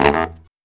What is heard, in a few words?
Killer Brass Riffs